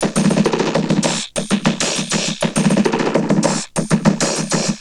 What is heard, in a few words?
Vocobeat; Sonic Foundry (Sony Creative Software)